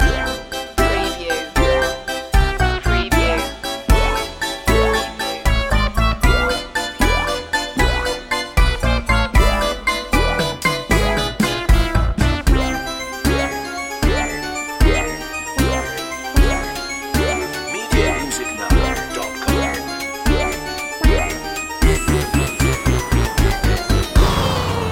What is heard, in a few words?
retro video game circus loop